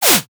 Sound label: whip whoosh